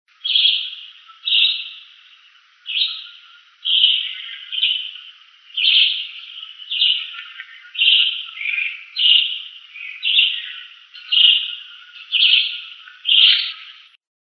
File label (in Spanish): Chivi com; El Destino